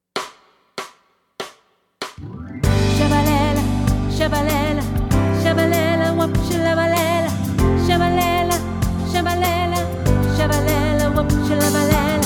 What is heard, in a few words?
Let the choir sing Alt